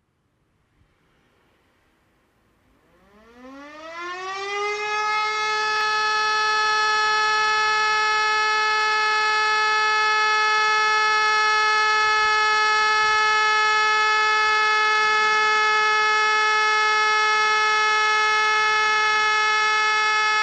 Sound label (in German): Sirenensignal Entwarnung Deutschland